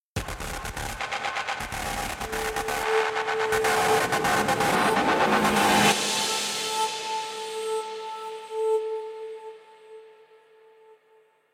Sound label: Guitartheme; Terra Invicta